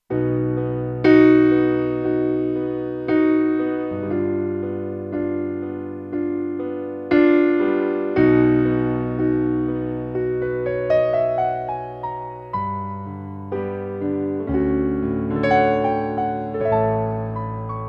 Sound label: Soft Piano, Kurzweil K2500X; Factory Programs